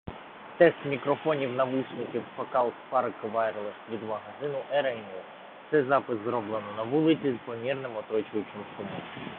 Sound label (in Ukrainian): на вулиці; Focal Spark Wireless